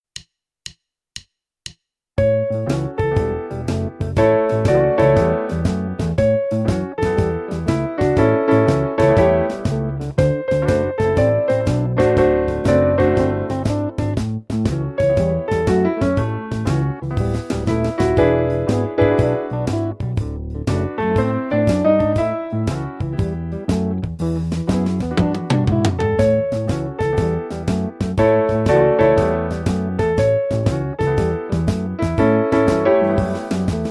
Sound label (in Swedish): Blues